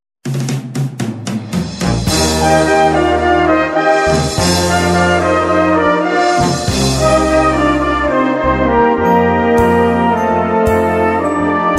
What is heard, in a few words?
Trumpet